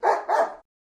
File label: Shepherd Dog Dbl Bark